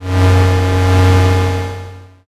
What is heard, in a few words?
space engine